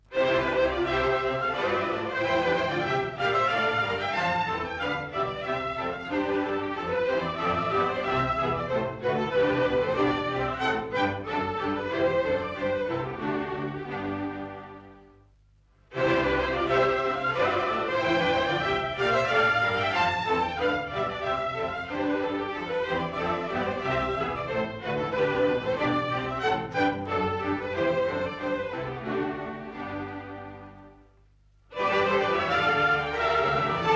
Concerto No.1 in C major - II. Courante; J.S Bach

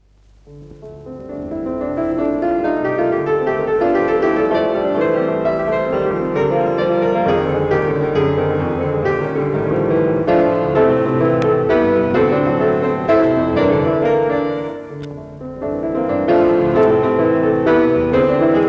Preludes - No. 6 in E-flat minor; Gabriel Faure; 9 Preludes

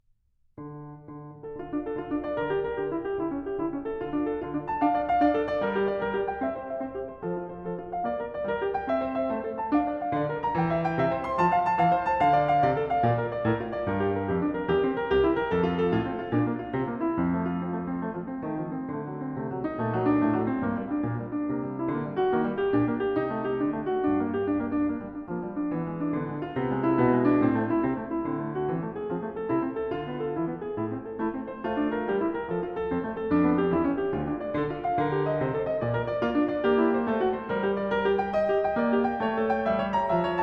Well-Tempered Clavier, Prelude No. 6 in D minor; Book 1; BWV 851; Bach

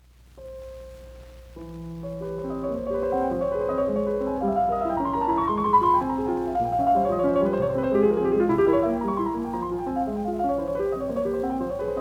Etudes - No.2 in F minor presto; Chopin; Twelve Etudes Op.25

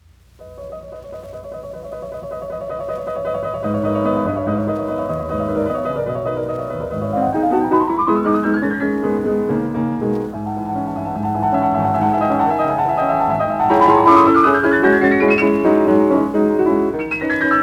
Etudes - No.6 in G sharp minor - Allegro; Chopin; Twelve Etudes Op.25

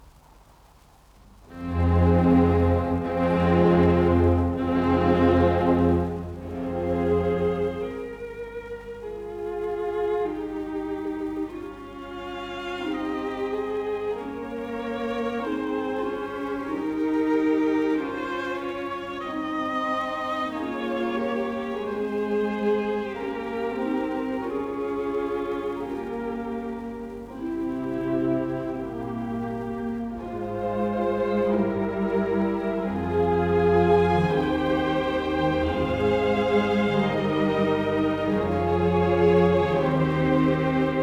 Concerto Grosso in C minor - II. Grave; Handel; Op.6, No.8

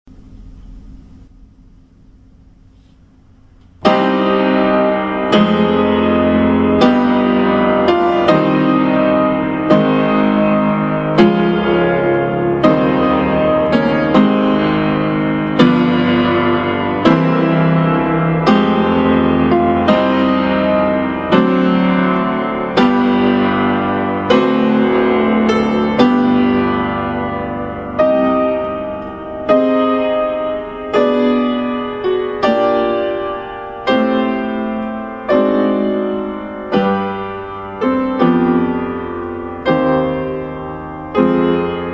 Prelude In C Minor; Chopin; Op. 28

